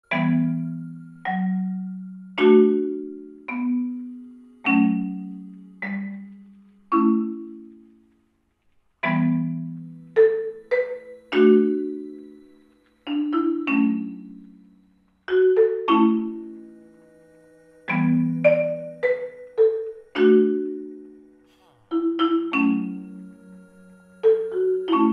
Marimba; Minecraft

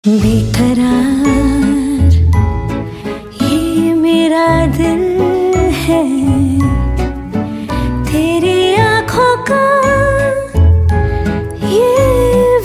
Female Version

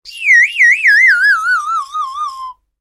cartoon slide whistle playing down

